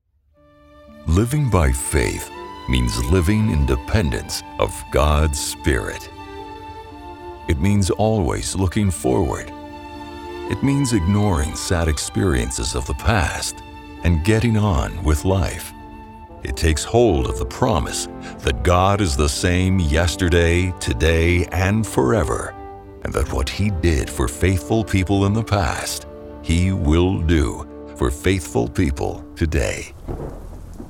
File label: Worship